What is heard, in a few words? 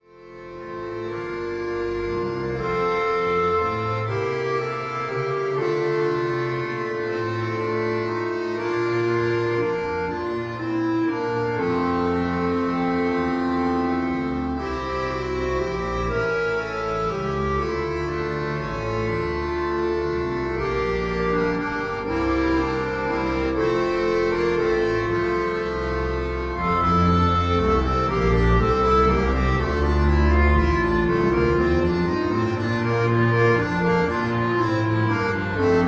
Leise rieselt der Schnee Trio Computer